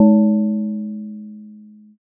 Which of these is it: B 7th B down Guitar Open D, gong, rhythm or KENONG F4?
gong